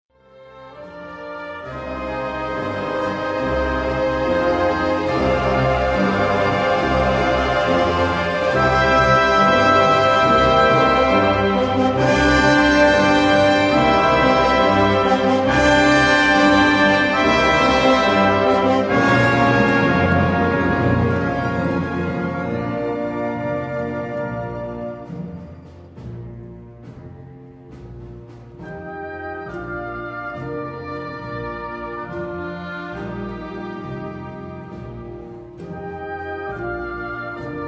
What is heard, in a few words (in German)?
marsch short